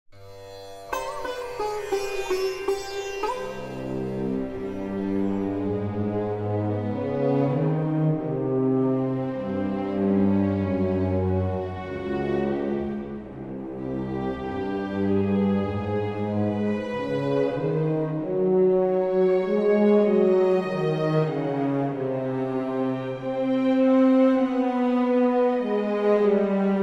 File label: Music; cinematics; Age of Empires III - Complete Collection; Microsoft Studios